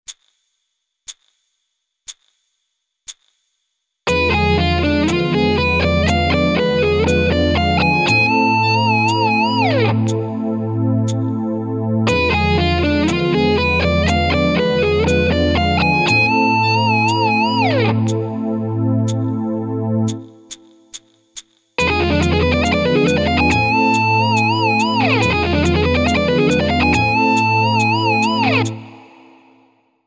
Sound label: Sweeping example